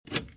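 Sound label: tower button hit; Cars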